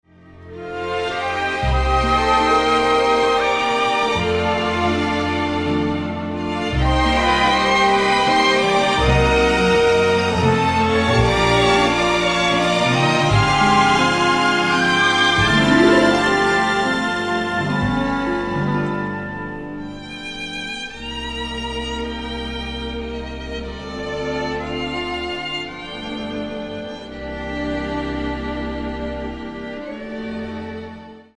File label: Waltz